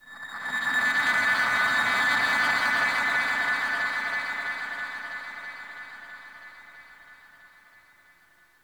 Space Random; Warframe; Hub; Liset Space Randoms

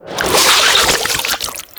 Hive Shell Grow; Encounters; Exterminate

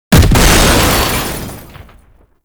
Shield Lancer Weakpoint Destroyed; Warframe; Lasrian Soldiers; Weapons

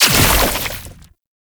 Entangle Slow Down; Warframe; Oraxia

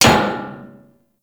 Ship Hit; RailJack Hit Effects

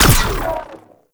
Space Fighter Laser Fire; Warframe; RailJack; Corpus; Space Fighter Laser Agent

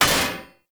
Short Sword Medium Impact Heavy Armour; Weapon Impacts; Player; Swords; Combat

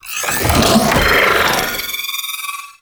Zanuka Companion Clone Cast; Warframe; Characters; Abilities

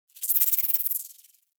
Foliage Insect; Warframe; Duviri; Ambience

